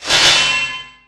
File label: Pickup Armour; SFX Kit; Pickups